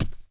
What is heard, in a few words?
Sphinx Walk; Playable Tutorial Level